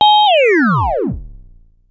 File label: Sci fi